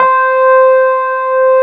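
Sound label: Wurlitzer C4 - L; USB Soundscan vol.09; AKAI; Keyboards Old School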